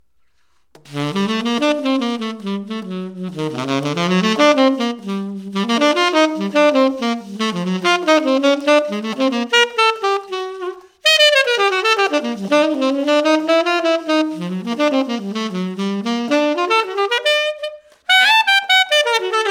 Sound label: Tenor Sax Improv